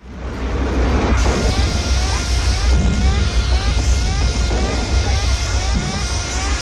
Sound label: ALARM BLARING FURIOUSLY